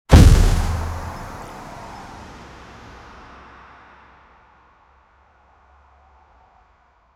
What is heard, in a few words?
car hit effect, collision